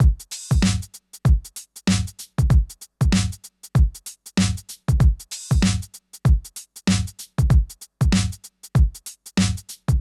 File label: DRUMS, viral hiphop